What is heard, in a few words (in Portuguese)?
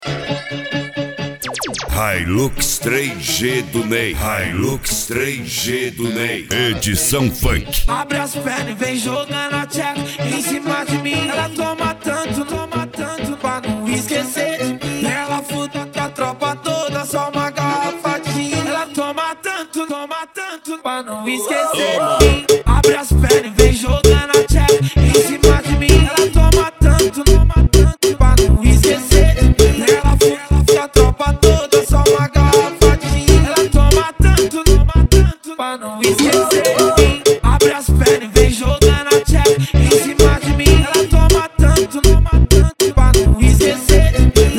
esp funk